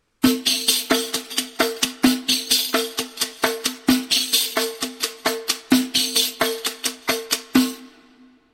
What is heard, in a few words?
riq